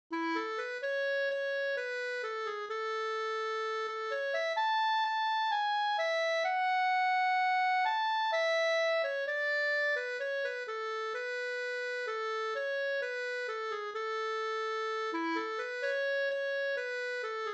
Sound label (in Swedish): brudmarsch 4-4